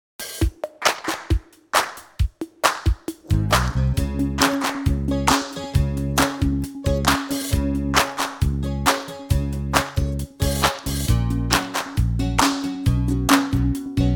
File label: Song instrumental